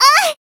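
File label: Voice damaged, Japanese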